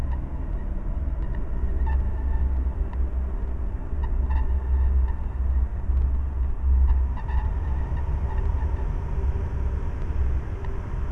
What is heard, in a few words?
Ambiance